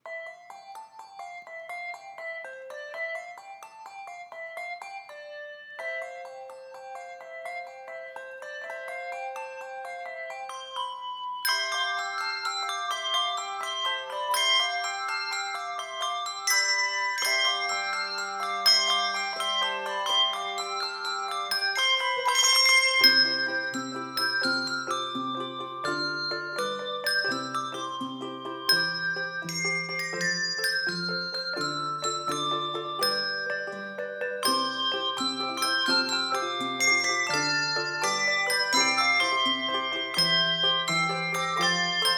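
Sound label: Handbells 3-5 Octave; Lorenz Corporation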